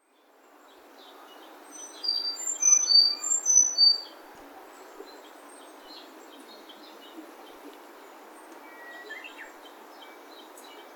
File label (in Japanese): エゾムシクイ; 北本市